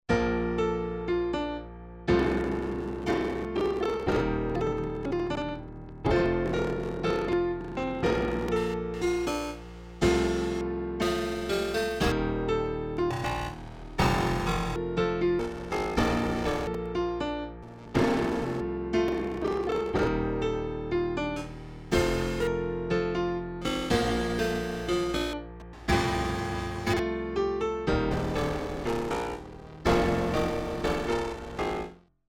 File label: yamaha sy55